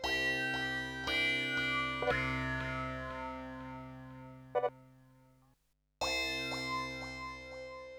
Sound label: Trance